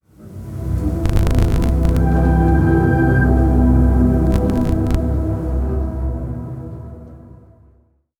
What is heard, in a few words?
dark logo